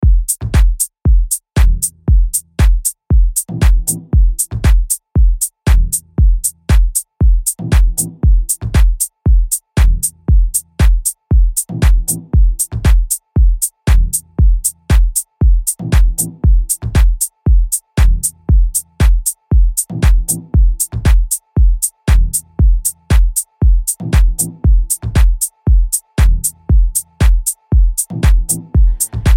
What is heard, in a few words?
Rainforest Spiritual Afro Drum